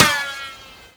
ricochet, bullet impacts; Half Life Model Pack

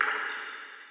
distant drip, water; ambient

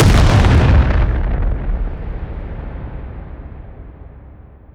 explo; destruction; wall

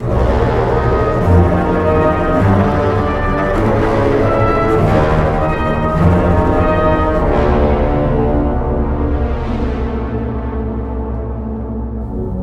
distillery plug open, music

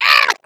egg bot hot laser; Portal 2

